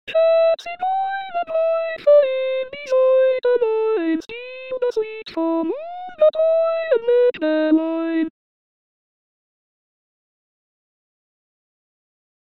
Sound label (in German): Zigeunerlieder soprano